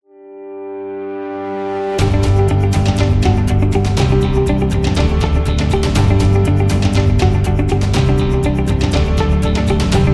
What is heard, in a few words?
Epic Upbeat; corporate music vol 18